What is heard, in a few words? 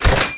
door open; quake2; inland